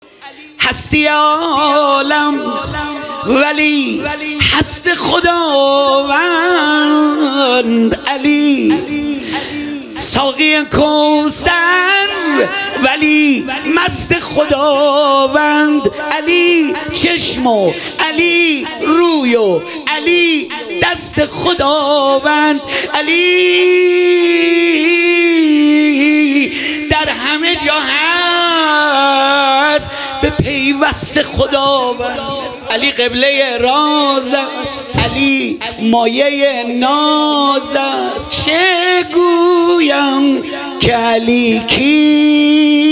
مولودی

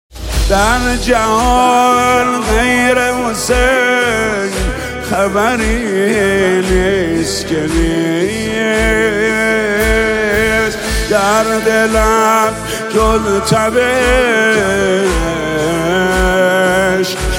مداحی احساسی

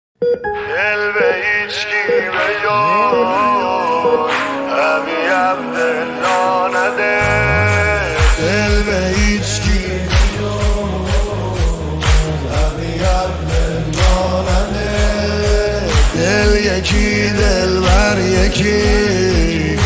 نماهنگ

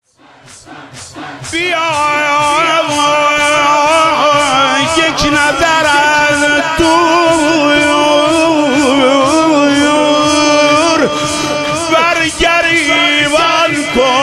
مداحی

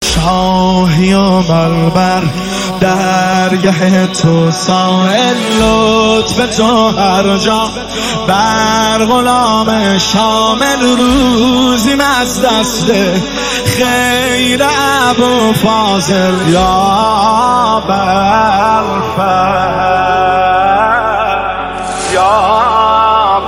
مداحی

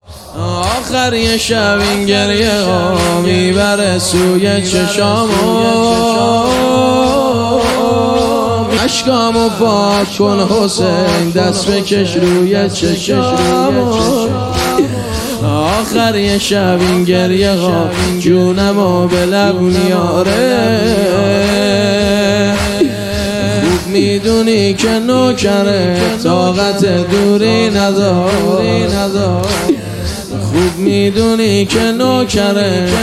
مداحی

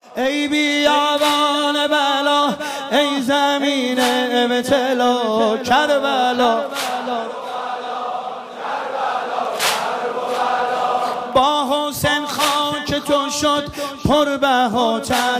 مداحی

